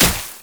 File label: manta strike; LoL; fizz